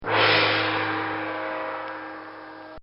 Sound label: Chinese Gong; Brass; Musical; Bobby Cole